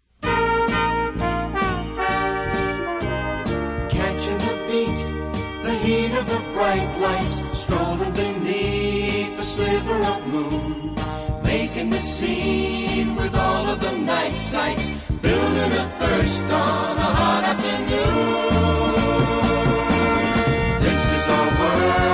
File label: Chorus; Pepsi International